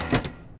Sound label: radar servo stop; machines mechanical